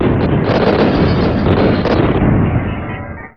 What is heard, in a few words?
erase; super mario paint